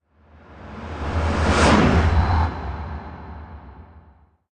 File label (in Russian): Zvuk vzhuh dlia montazhnogo perehoda